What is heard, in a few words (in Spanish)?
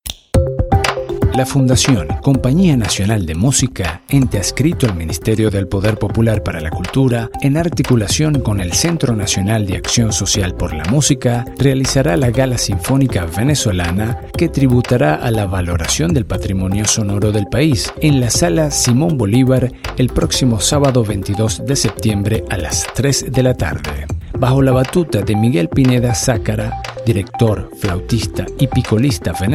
Filarmonica de venezuela